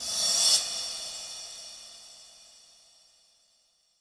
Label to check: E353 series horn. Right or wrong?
Wrong